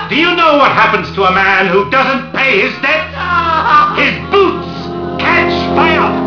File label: shakes; Schuetzenchor